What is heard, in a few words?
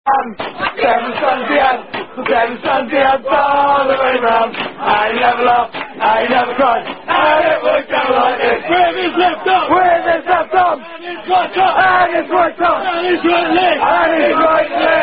Father Abraham Sung By Drinking Football People; Father Abraham Football Yobs